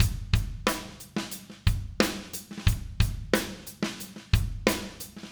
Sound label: 090 Alt Rock Drums Verse; Pop Rock; Moderate Tempo; Pro Tools Essential Loops; Digidesign